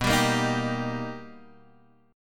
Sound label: B Augmented Major 7th B Guitar Standard; B+M7; strummed; 7,10,8,8,8,x; JGuitar